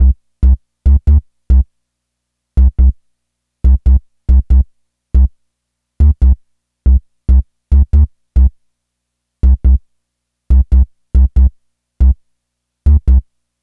Ped Bass, Bass Lines; Sonic Foundry (Sony Creative Software); Electronic Point Blank; Basses

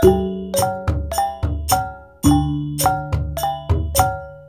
WYANG L, Heart of Asia; Spectrasonics - Heart of Asia CD2; W.KULIT

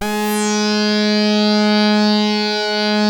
SAW RES WET, POLYRES SAW2, Synth; InVision Interactive; Keith Emerson; The Most Dangerous Synth and Organ

